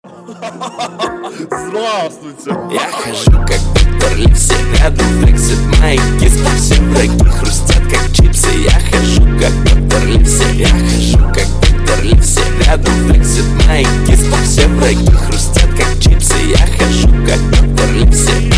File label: phonk remix